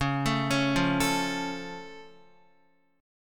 Db Augmented, down, Guitar; arpeggiated; JGuitar

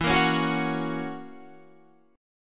F sharp Major E

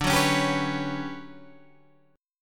D Major 13th D down Guitar Standard; strummed; JGuitar

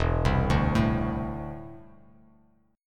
E 7th Suspended 4th Sharp 5th; E7sus4#5 arpeggiated; 0,3,0,2; JGuitar

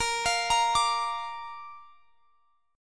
Bb Major; arpeggiated; x,x,20,22,23,22

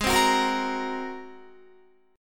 G sharp 9th G sharp Guitar; G#9; strummed

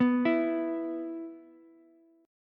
E 5th E down Ukulele; arpeggiated; JGuitar

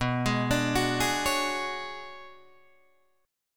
B Minor 6th Add 9th B, Guitar, Drop D

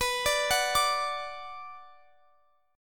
B Minor B Guitar Drop D

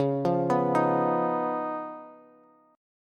Db 9th Db down Bass; JGuitar

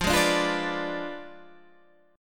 F Diminished 7th F down Guitar Standard; Fdim7 strummed; JGuitar

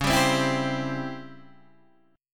C sharp Minor Major 7th Flat 5th, guitar chord

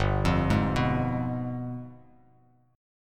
G 7th Flat 9th G down, Bass Standard; arpeggiated; JGuitar

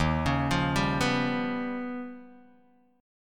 Eb Augmented 9th Eb down Guitar DADGAD

